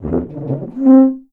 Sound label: TUBA RUN UP